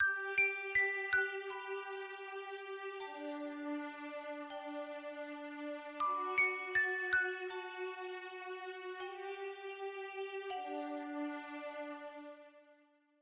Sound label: Sea